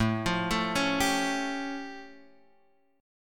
A Augmented 7th A; A+7 Chord {X 0 3 2 2 3} arpeggiated

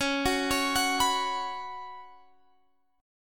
B Suspended 2nd, Db, chord

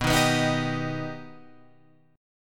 B Augmented B Guitar Open F, chord; strummed; JGuitar

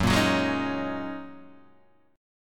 F sharp 6th Add 9th F sharp Guitar DADGAD

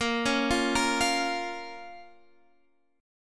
A sharp Minor, Guitar Half Step Down

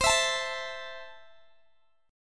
C 6th, C, down, Guitar, Open G

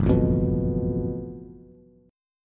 Gb Minor Major 11th Gb down Bass Bass Standard; GbmM11 strummed; JGuitar

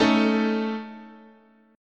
A Major Flat 5th, guitar chord

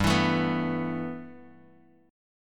G 6th Add 9th G Bass Standard, chord; G6add9 strummed

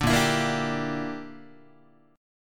Bb 6th Flat 5th Guitar, chord; Bb6b5 strummed

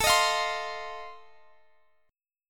F 7th A

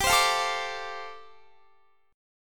G 7th Suspended 2nd Suspended 4th, Guitar

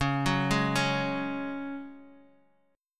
C sharp Suspended 4th, Guitar, Open D

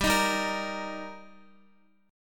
G Minor Sharp 5th, Guitar; strummed; x,x,7,8,7,6; 4F,4A,5C,5G,5C,6E; JGuitar

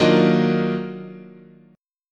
D sharp Minor Major 9th, guitar chord

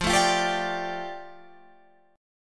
F 6th Add 9th F down Guitar Standard, chord; F6add9 strummed

